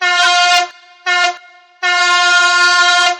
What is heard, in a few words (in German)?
D Horn kommen